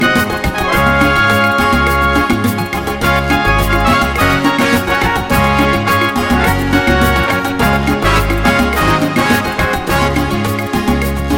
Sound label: Quick and Happy